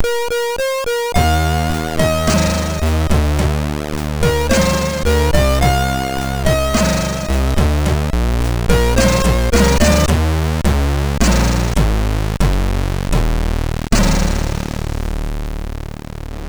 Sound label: Jingles, MOS6581R2; sid